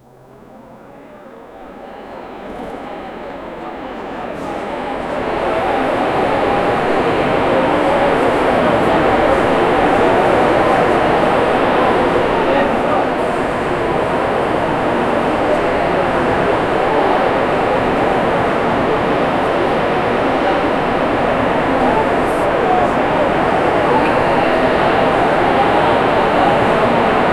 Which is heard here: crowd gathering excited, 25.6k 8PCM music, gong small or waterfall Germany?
crowd gathering excited